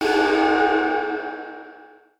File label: horror text